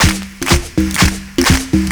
PERCS, 70 s Breakbeats

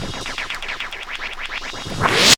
FX Rev

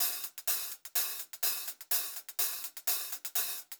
Pop-Rock Drumloops; USB Soundscan vol.37; AKAI

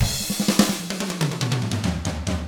HARD ROCK, Drums; ILIO; Double Platinum Drums 1

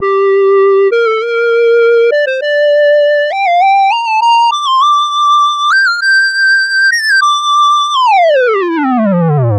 G Phrase Dry Synth Flute; Zero-G; Future Wave

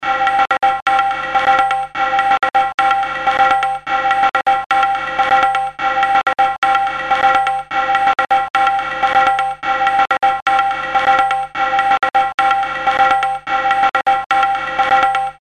Tablas; Industrial

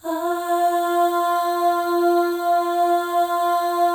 AHS, Symphony of Voices, FEM; Spectrasonics; FEM.2 AHS

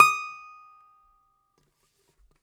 DOMRA, Russian Strings

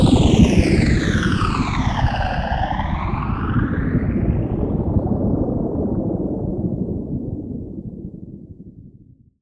NOISE, Production Effects

